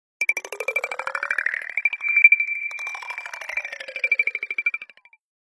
Glider; Nokia N86

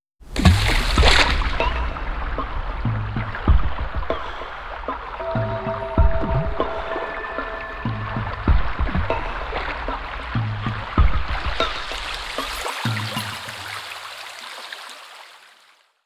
UnderWater II w drums; Sony Ericsson; C901; PlusFourSix; Lars Edvall

